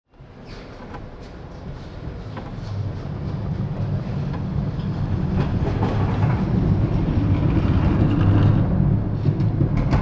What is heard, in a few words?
cable car leaving